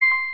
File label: Turntable Rotate